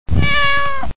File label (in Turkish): kedi miyav; hayvanlar